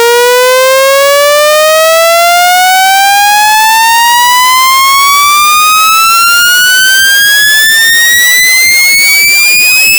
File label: ramp alias